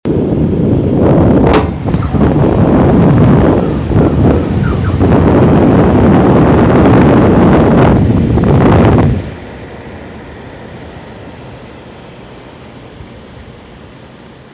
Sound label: rocket